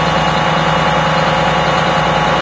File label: diesel; ambient; machines; Source Engine; cstrike